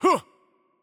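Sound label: Attack Light, Spanish; bloom minigame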